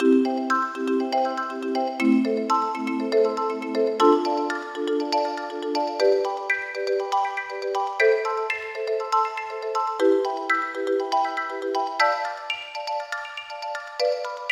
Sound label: Arpeggiator Tonal Harmonizer Rhythm Pattern; approximately 33 seconds; MachFive 3 Marimba, Arpeggiator, Tonal Harmonizer, NOTION 4